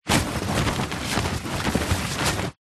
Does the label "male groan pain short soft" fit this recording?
No